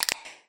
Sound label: grenade blip; infestation; Half Life Alyx; xen